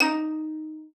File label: D#4, VIOLIN SPICC; Xsample VOL-5